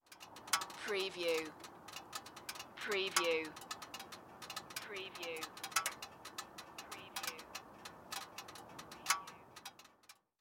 Car Mercury Sable Hot Engine Clicks

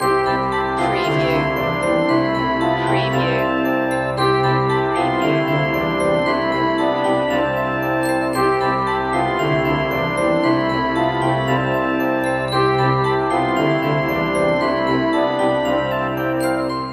xmas loop ending

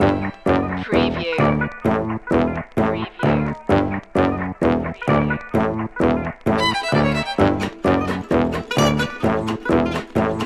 doo wop bop loop

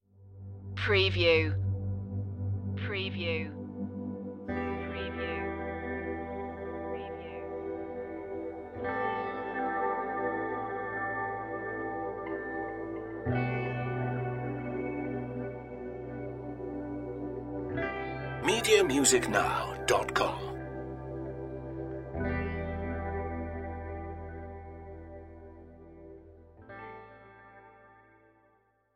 ambient flow

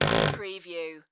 Wood Creak; sound effects bible

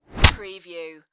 Punch Body Meaty

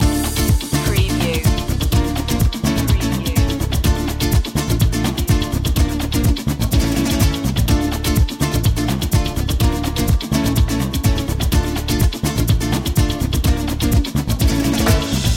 cha cha chase loop

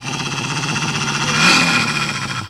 dog creature growl

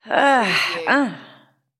Female Tired Sigh

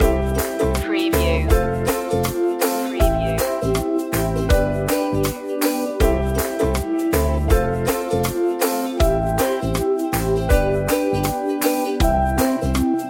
ukulele loop

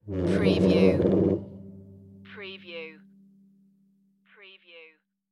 move metal furniture

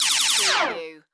Laser Shot

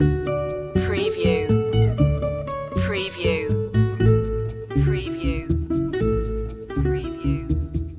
spain guitars loop

